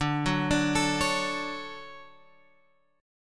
D Major 7th, Guitar, Open G

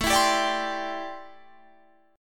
D 7th Suspended 2nd Suspended 4th A

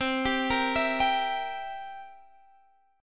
B sharp 7th B sharp; B#7; arpeggiated

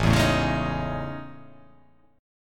A Major Sharp 11th

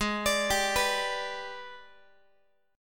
G sharp Minor Major 7th Flat 5th G sharp, Guitar; arpeggiated; JGuitar

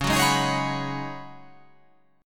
C sharp 9th Suspended 4th C sharp down, chord

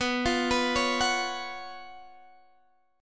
Db 11th B, guitar

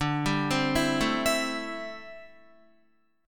D 7th Suspended 2nd Suspended 4th D, Guitar; D7sus2sus4; arpeggiated

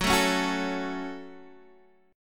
F sharp Minor, Guitar Open D; chordshape; down

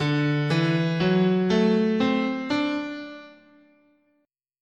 D Dominant Pentatonic, scale

